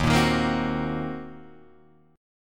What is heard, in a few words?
D sharp Minor 6th D sharp down Guitar Drop D